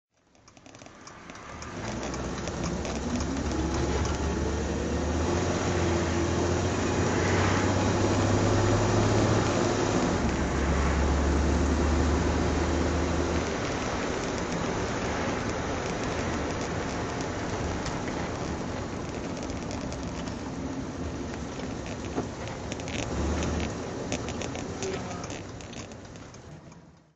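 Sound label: jag mkx drive, Engine sound driving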